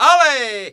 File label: Flamenco, Vocals, Male